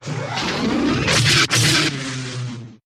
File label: Blaster